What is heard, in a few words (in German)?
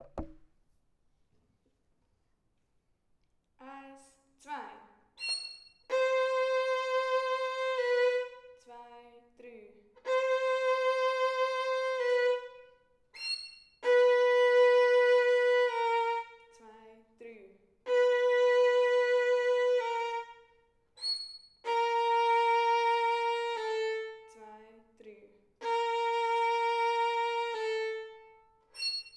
Violine; Kinderorchester